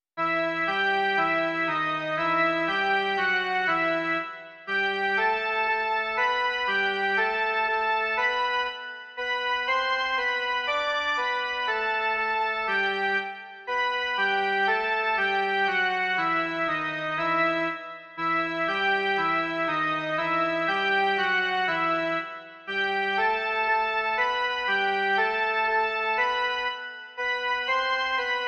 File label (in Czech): jen melodie; Ó Pane, zůstaň s námi jen